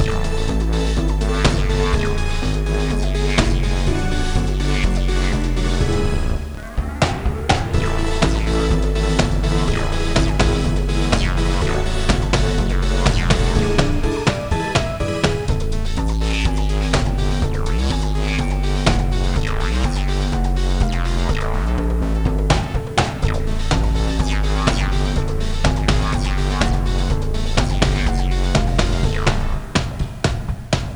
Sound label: Self Destruct Loop; MX SelfDestructBenji; 10 Second Ninja X